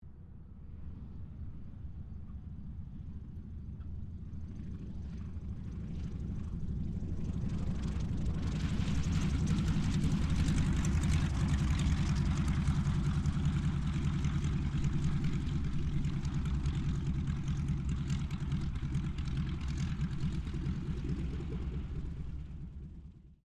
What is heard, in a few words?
AIRCRAFT AT-6D HARVARD MK III LAND; Temora Harvard MK III; Airplanes; Vehicles; Airplane Sound Effects